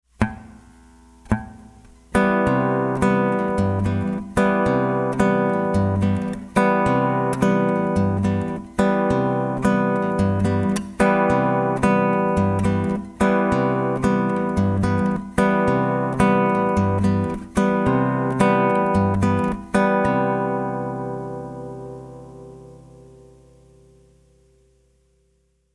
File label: right bossa, bossa nova brazilian rhythms, guitar